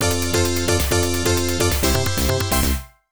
Success; King Salmon; Genesis

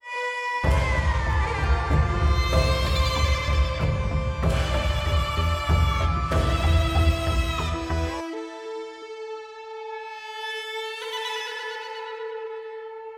Music Tutorial; Sifu

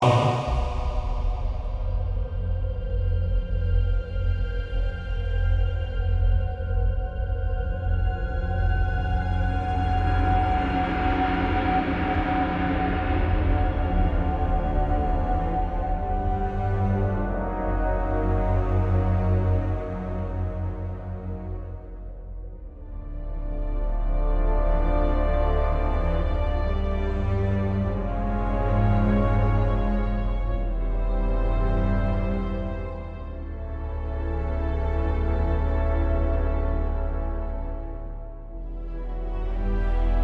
Tension Spooky; Global Conversation

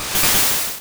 Confuse Ray; sfx; attack moves; pokemon; gen 1; rby

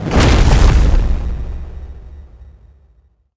Boss Hands Hit; helltaker

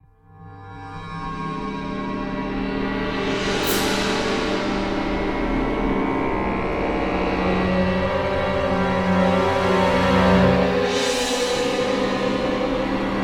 Danger Alert Clusters & Cymbals; Mission Music; Starcraft 2 Wings of Liberty

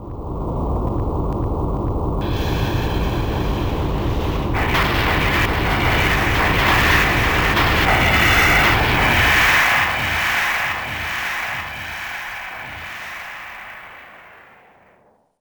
Area Clear; Sengoku 2; arcade

